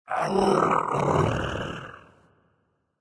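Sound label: Movement Growl; smite; god bakasura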